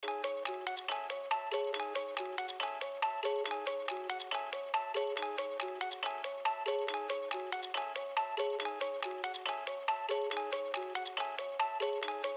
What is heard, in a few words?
Melody